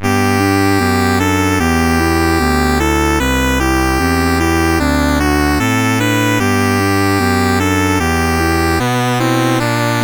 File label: CSG8580; CSG8580R5